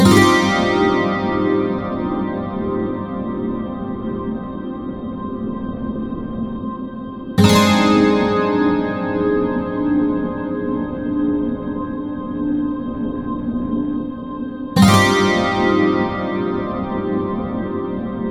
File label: Limbo save; Fall of Light